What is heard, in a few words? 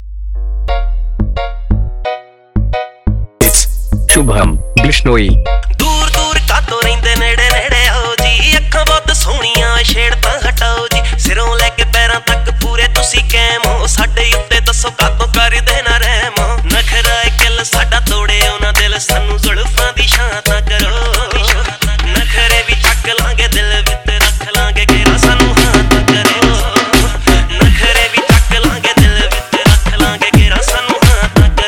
Dhol Remix Dj Song